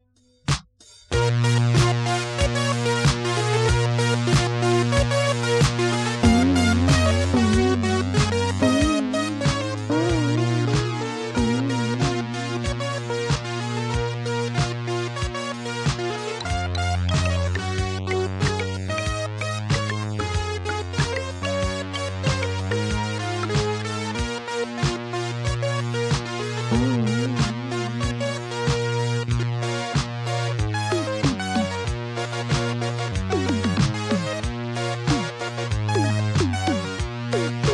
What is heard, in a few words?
Synth Pop Arpeggios; tape